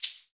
Ahfuche; Club-50 - Foundations Roland; Ahfuches

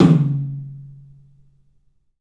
TOM, 3D Drums, CLUB TOM; 3D Sound

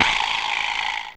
Vib Slp, Percussn; Fairlight CMI IIe

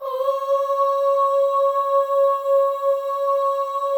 FEM OHS, Symphony of Voices; Spectrasonics

